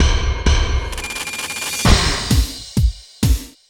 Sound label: 80s Funk Beat, Drumloops; Dr. Fink's Funk Factory